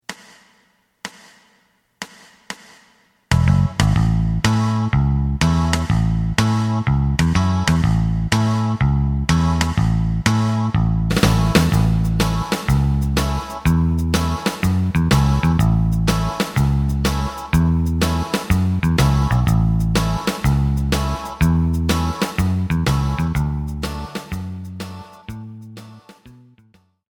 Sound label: lonely guitar lead